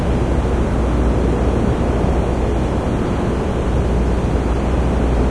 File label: arena tone loop, ambience; ambient